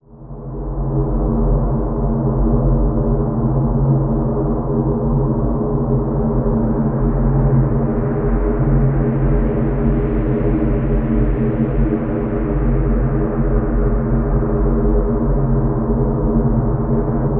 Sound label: THROB; Zero G; Malice In Wonderland